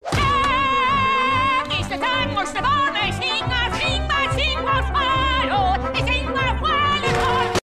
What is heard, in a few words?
singing